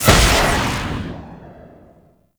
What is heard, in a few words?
Super Weapon Missile Fire; Warframe; RailJack; Proc Ships; Corpus